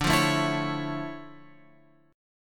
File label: D Minor Major 9th D; DmM9 Chord {10 8 7 9 X 9} strummed